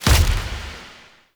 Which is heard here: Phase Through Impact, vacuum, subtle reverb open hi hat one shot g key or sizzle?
Phase Through Impact